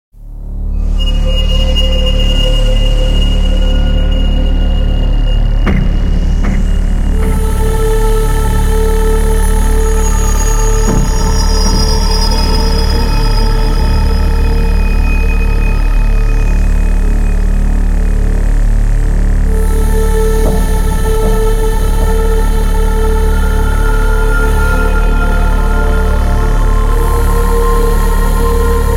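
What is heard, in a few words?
scary melody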